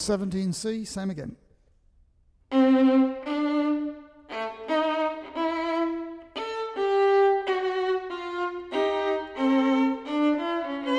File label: fugues